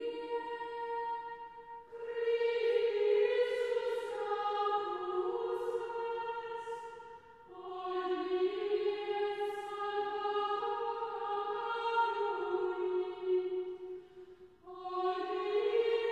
Chant Antiphon; Traditional; Chester Processional

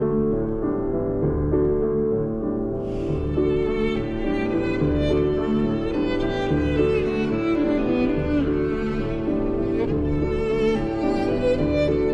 Violin and Piano in D; Faure, Gabriel; Berceuse; Opus 16

